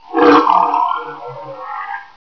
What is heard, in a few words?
ambient xenome scream